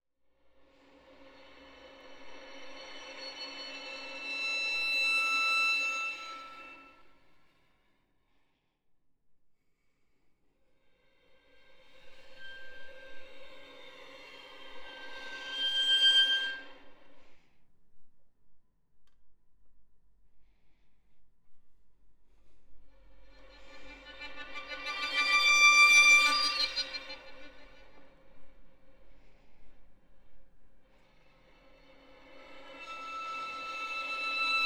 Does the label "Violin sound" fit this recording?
Yes